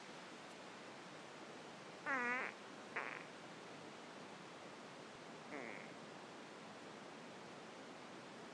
tacos, fart

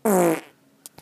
Standard, fart

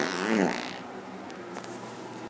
Splatter, fart

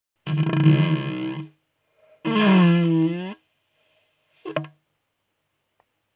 Haunted house, fart

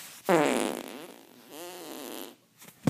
Crybaby, fart

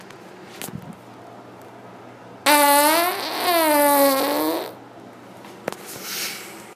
Indy Car, fart

